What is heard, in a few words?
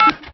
Spin Button; knight rider